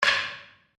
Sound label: Wood On Wood